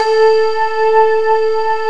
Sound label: LED, S-LEAD